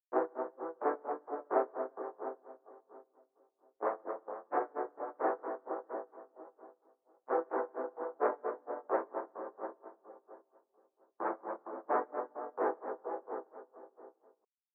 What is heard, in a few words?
130 BPM A Min Dark Horns; Trap; Melody Loops; Loop Stems; Cymatics; Nightmares; Champ